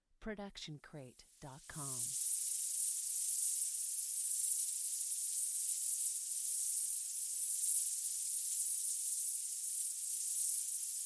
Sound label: Magic Portal; SoundsCrate